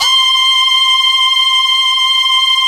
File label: JAZZY FLU, JV-1080; Syntec; Wall of Sounds